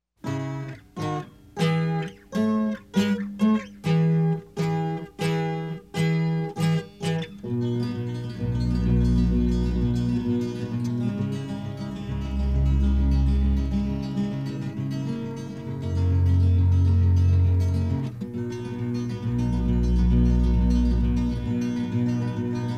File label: Instrumental